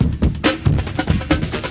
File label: 139 bpm, funky gold break, drum loops and breaks